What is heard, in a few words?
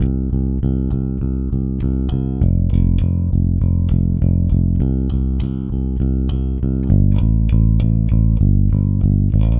Rock, Bass; Bass X